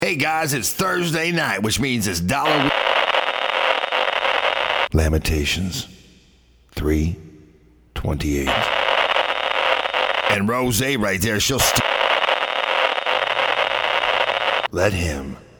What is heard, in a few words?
static